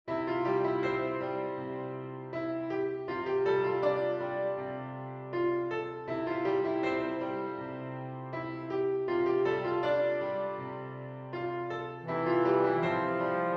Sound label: trombone; bass clef or bb treble clef